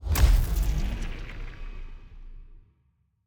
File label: UI Puzzle Failed; A Year of Rain